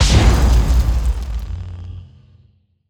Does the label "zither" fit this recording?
No